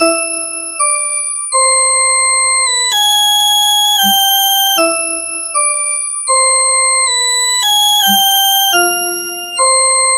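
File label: high energy game achievement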